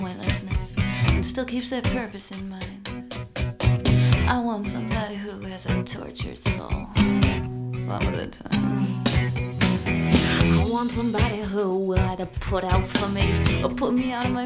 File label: rock